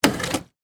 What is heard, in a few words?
Fountain Drink, Soda, Machine, Dispense, Short; Soda Guns; BlastWave